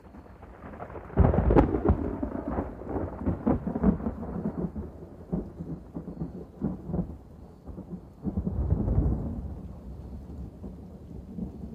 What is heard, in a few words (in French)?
Orage sec loop